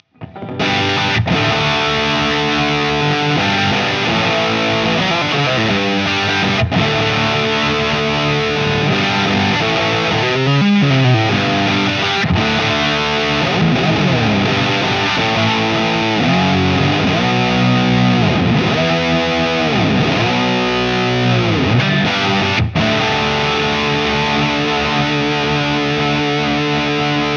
High Gain Rhythm 4x12 Modern S12M20; Scuffham Amps S-Gear; The Jakal

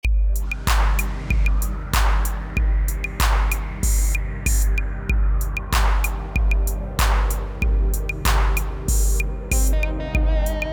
Behringer 1273 KT noEQ

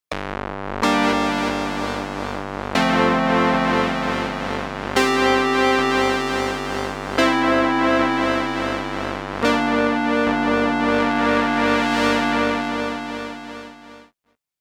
agressive Brass

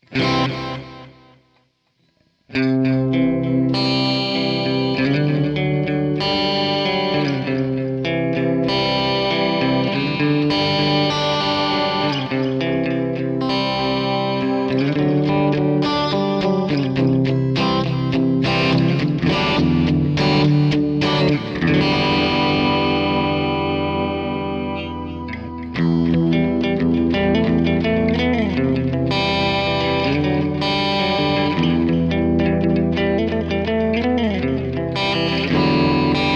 MXR Joshua Ambient Echo Mod Voice

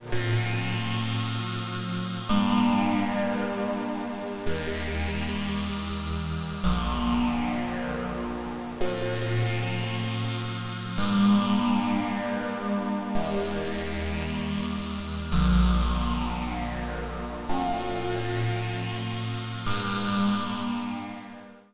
Phaser 2x Delay

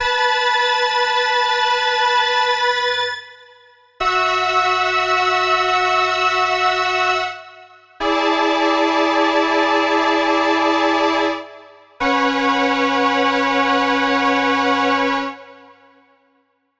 VSM IV Farfisa Soundmaker; GForce

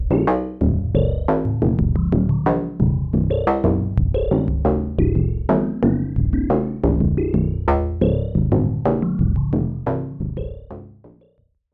Behringer Syncussion SY-1 Sequence

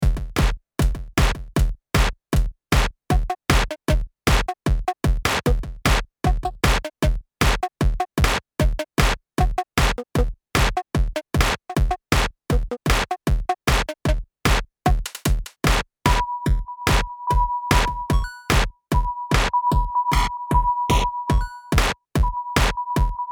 Drum Kit

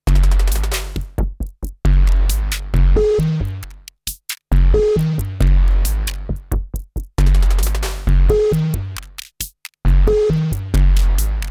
Trinity Arcade Mode; Modbap Modular

